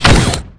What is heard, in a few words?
Throw Target; Unreal Tournament Demo; BotPack